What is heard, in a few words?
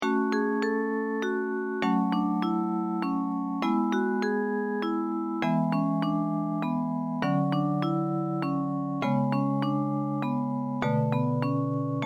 elegant waltz